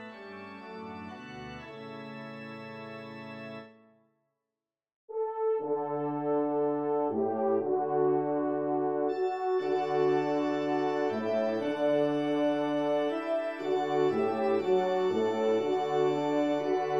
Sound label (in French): Elevation Trp Org; messe cantin